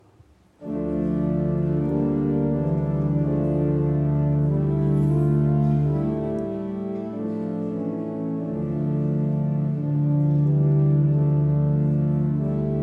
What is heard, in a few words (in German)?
Orgelnachspiel; planitz; laetare 2020